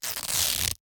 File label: repair sound; items